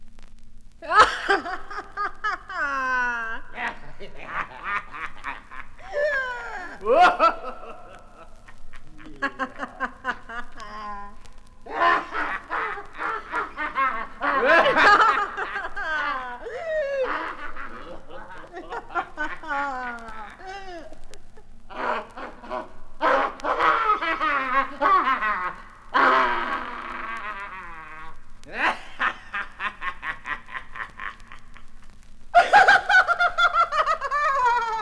lunatics laughing - scary laugh; Equipment used: TTA05USB akai player and focusrite 8i8 interface, using an SSL limiter; Recorded from Sound Effects - Death and Horror rare BBC records and tapes

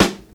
original snare sample g key; snare drum samples

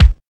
natural steel kick drum b key

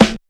low tone snare drum sound A key

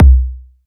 small reverb steel kick drum sample c key

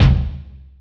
mid heavy kick drum one shot c sharp key

